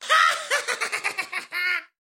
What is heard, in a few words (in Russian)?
smeh goblina